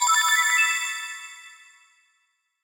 decision, button

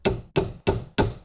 wood nail

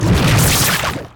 ax slash

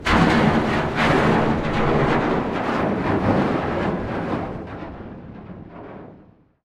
ship collision

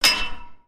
metal

